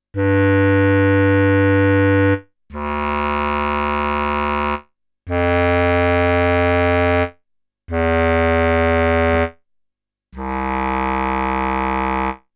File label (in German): Klarinette